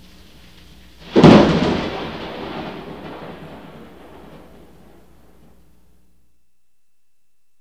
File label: thunder